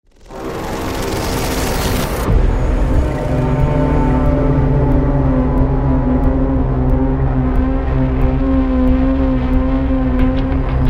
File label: Horror